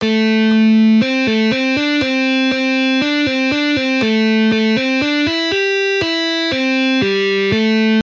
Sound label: Rests example